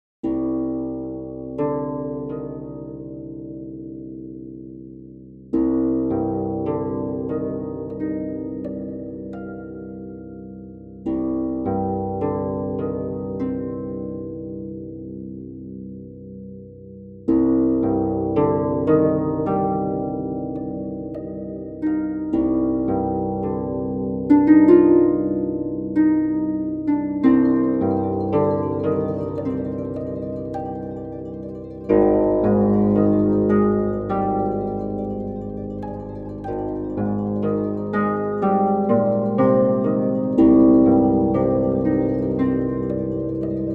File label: Arpeggio; Sonokinetic